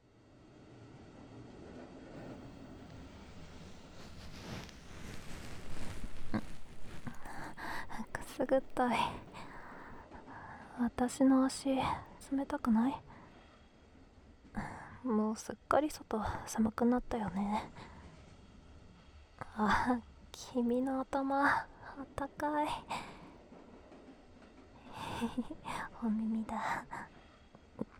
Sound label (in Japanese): 右耳かきパート, ASMR